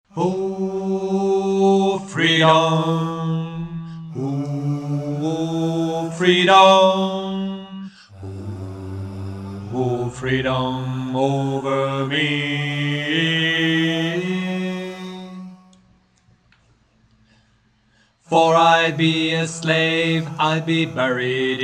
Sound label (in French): basse; ofreedom